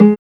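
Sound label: GUITAR TONE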